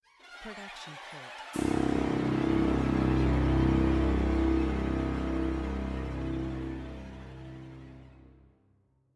screeching strings and low

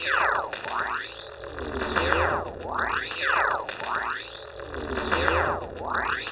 scifi power up

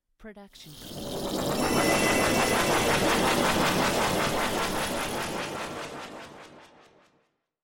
magical item grab; soundscrate

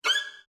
Violin Psycho Screech Single

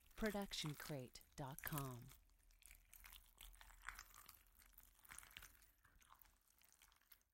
squishy long; soundscrate

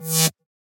electricity hum short; SoundsCrate

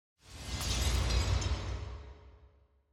shoved glass

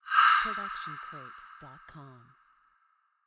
Scary Voice

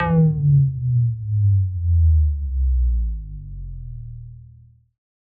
Bass Drop; SoundsCrate

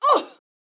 woman injured grunt

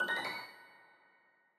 click fx tonal; soundscrate

